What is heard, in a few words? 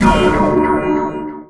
Pickup Scifi Energy; SFX Kit